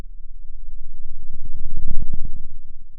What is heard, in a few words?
pop, Sound of a thunderstorm